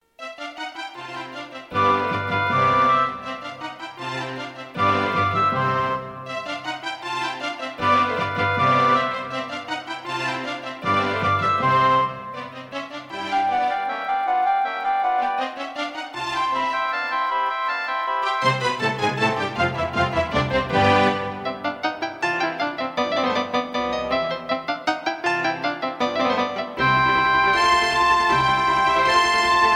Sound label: Creative Wave Blaster; classical; Mozart; Concerto K467 partial